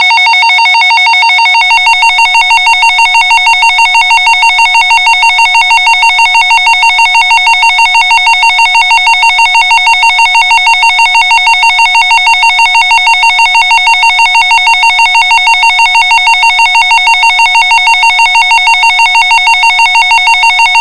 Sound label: special warning